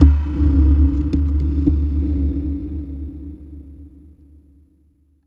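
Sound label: taiko guitar drum; Big Fish Audio - Alien Guitars; KINDA TAIKO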